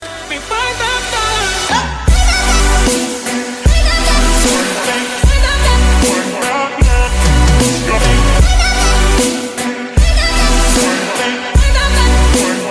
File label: trap ringtone